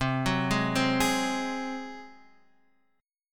Gb Suspended 2nd Flat 5th C

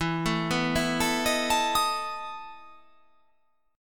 E Major 7th Suspended 4th E

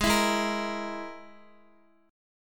G sharp Suspended 2nd Sharp 5th, Guitar; x,x,8,9,8,6; G#sus2#5 strummed

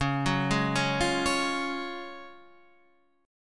Gb Major 7th Suspended 2nd

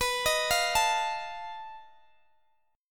B 7th Flat 5th B Guitar DADGAD

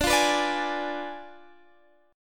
D Diminished 7th D, Guitar, Standard; Ddim7 strummed; x,17,15,16,15,16; JGuitar

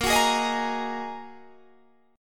A sharp 7th Suspended 4th Sharp 5th, guitar chord

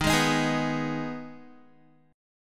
Eb Suspended 4th Sharp 5th, Guitar; Ebsus4#5 strummed; JGuitar

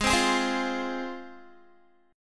Ab 6th, Guitar; Ab6 strummed

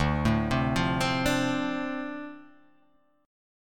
D-Minor 7th D, chord

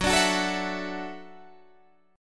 F sharp Minor 7th Sharp 5th

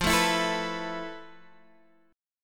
F Major 7th Flat 5th Guitar Open G, chord; x,10,7,9,10,9; down; chord shape

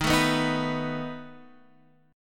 B 7th Eb

